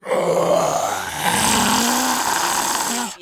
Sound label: CREATURE WET GROWL